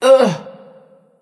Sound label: ugh; smite; voicepack; medusa